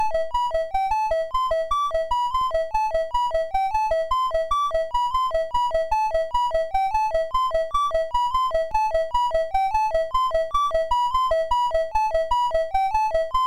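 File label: Magicians Ball, MOS 6581 SID chip music, games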